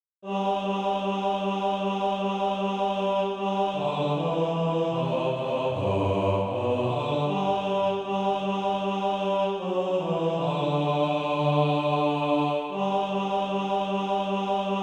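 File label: bass, Sheet music (SATB) including Practise Music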